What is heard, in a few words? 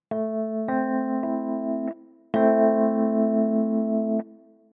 Am Chord